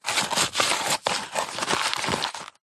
wallet leather rummage receipts

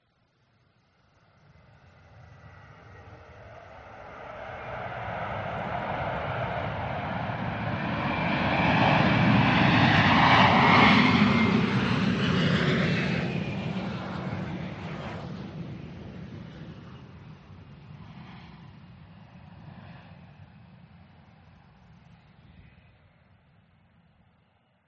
vehicles aircraft private jet land distance

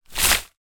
foley soil dry shovel load throw tip ground; zapsplat

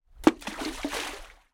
impact stone hit water rockpool beach splash

